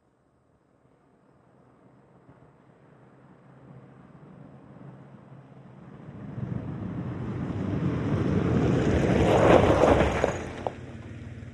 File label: Montero App Dirt Road, 1998 mitsubishi montero ext.